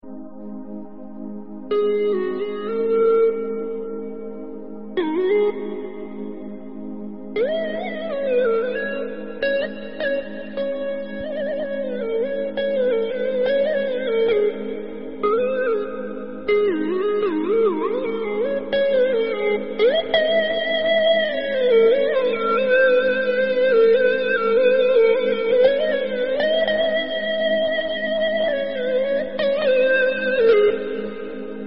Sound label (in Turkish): deep turkish web fon muzigi